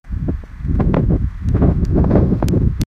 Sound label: the sound of the wind, wind